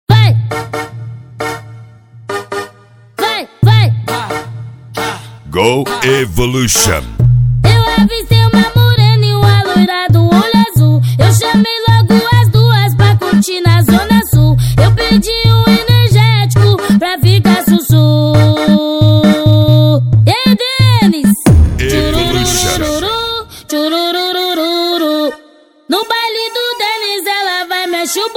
funk tumdumdum funk bass

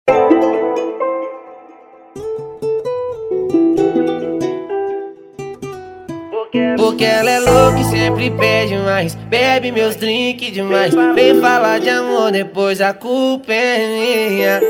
especial funk; cd bad boy som automotivo usa

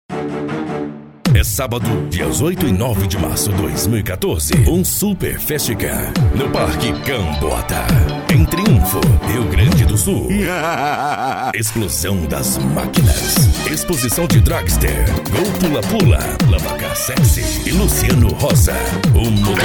bass; explosao das maquinas triunfo brasil

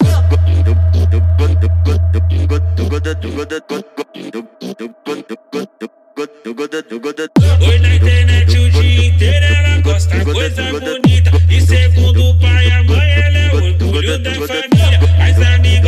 funk bass

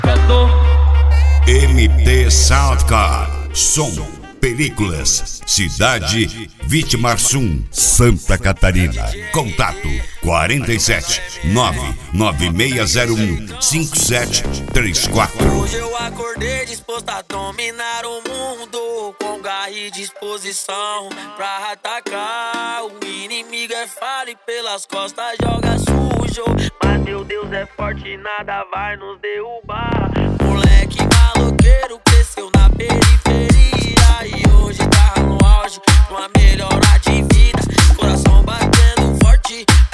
sound car; mt sound car

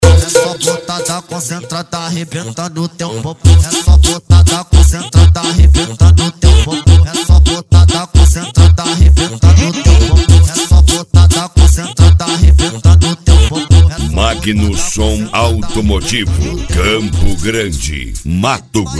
funk

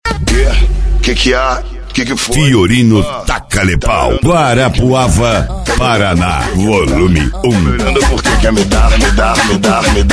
funk e electro house

